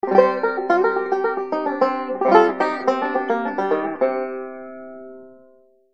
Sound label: ring tone, banjo